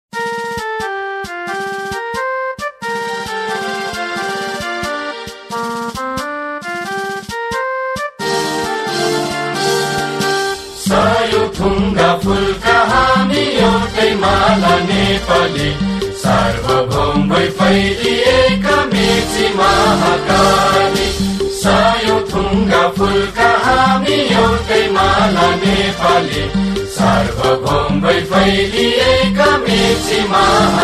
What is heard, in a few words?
nepali national anthem